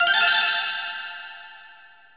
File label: Achievement